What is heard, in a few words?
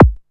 KICK, BASSDRUM, Techno 138 BPM; Best Service ProSamples vol.54; AKAI